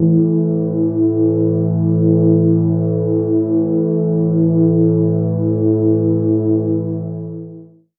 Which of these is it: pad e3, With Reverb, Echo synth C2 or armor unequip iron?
pad e3